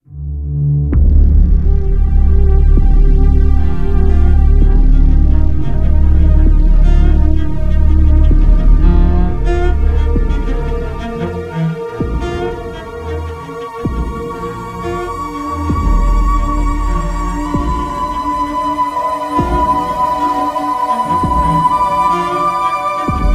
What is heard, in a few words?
Radio Music; let it die